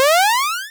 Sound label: powerup